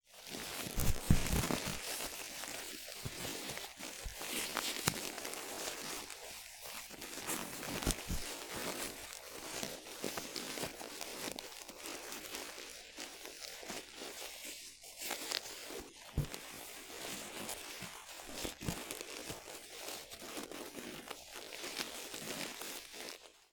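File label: sound of interference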